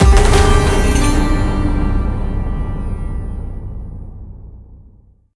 pot Jackpot; mercy of the gods

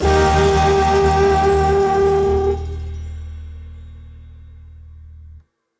big win end; grandspinn

